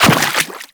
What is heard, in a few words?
run water, footsteps; Warframe; Teshin